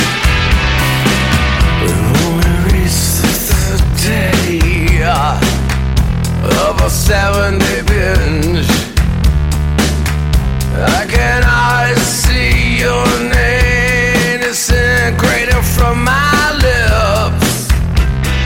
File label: Ringtone